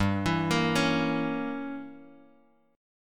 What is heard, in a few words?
G Diminished 7th, Bass Standard; arpeggiated; JGuitar